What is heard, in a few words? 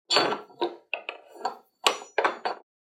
Metal Noise; soundscrate